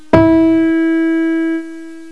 PIANO NOTE

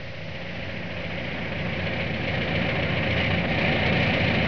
JEEP

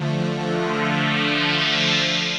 KEYB H2, ROCK POP, KEYBOARD; MAGIX

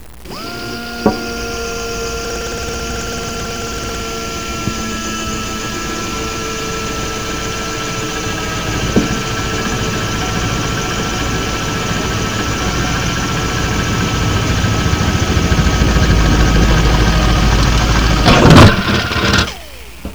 DRILLING

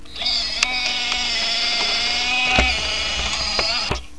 HYDROLIC, MACHINES

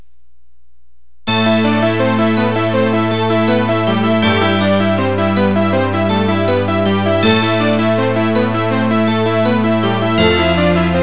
TUBE BELL

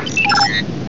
computer

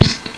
PSST

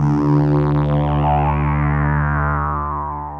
BUZZ; BEAT2000

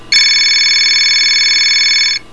TELEFONY

